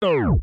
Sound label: reverse funk; tiktok